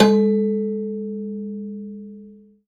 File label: Rubbery Piano, prepared piano; Prepared Pianos; Total Piano; Rubbery Piano 2 -ped